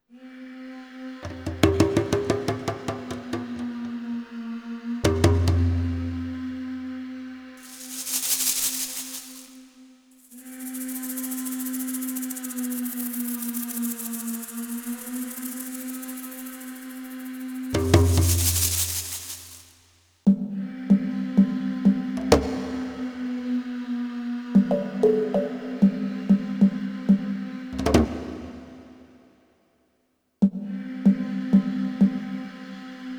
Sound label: Exploring (Alternate); carmen sandiego secret of the stolen drums; gc ps2 xbox gamerip 2004